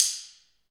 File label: Ambient Stix, Afro Wood; Roland LCDP14 Africa VOL-2